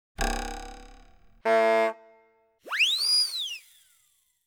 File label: Bonk, Honk, Whiz; BBC Sound Effects Library